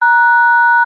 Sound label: household telephone DTMF tone hash long; zapsplat